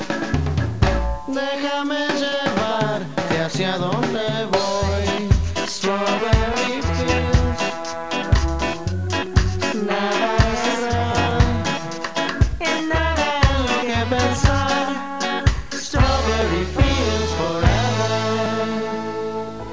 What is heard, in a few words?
music